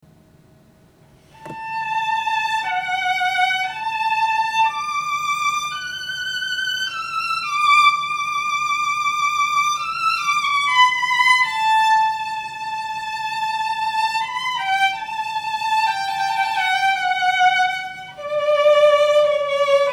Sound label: violins